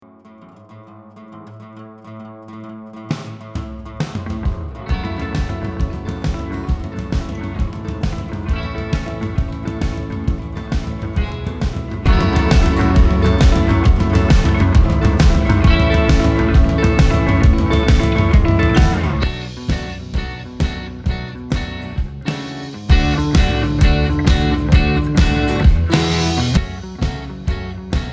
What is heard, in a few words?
indie rock